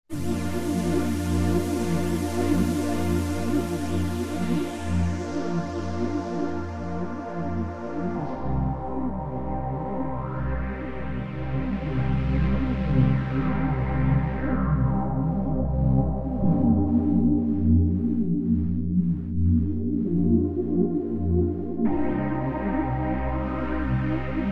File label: Flange Pad, yamaha sy77; sy77 pads